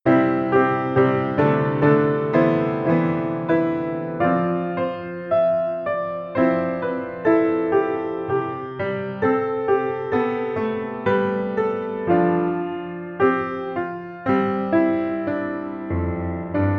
Steinway; 8Dio

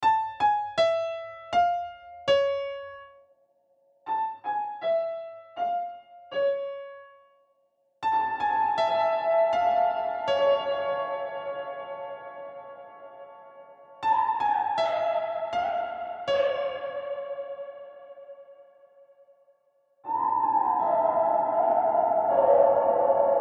REVERB Piano; CHERRY AUDIO GALACTIC REVERB

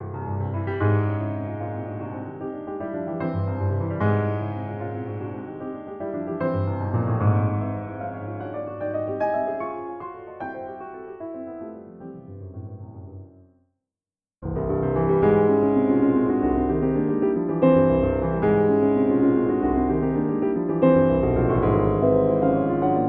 Rachmaninoff Grands

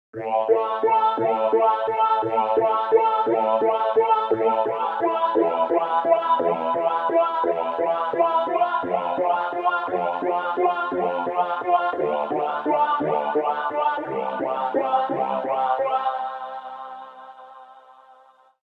Vocoder Plus ARP; XILS

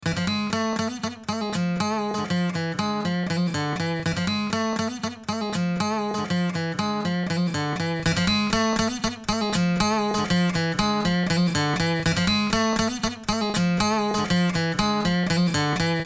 Dist Tube Culture Guitar; ARTURIA

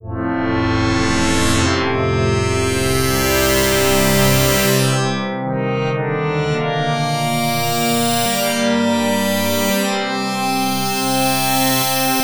Synclavier FM

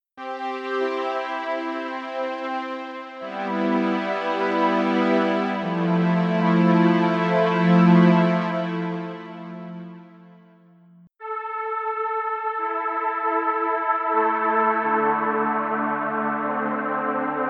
Solina Pads

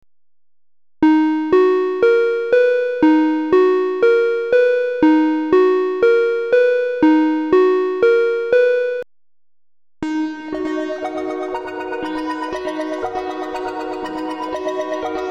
Arpeggio; UJAM FINISHER FLUXX

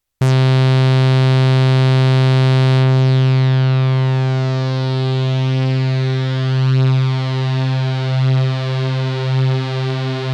SKULPT SPREAD Parameter; MODAL ELECTRONICS

